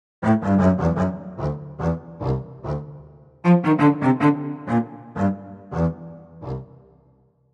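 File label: VCELO solo staccato, cello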